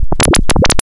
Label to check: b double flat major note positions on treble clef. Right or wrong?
Wrong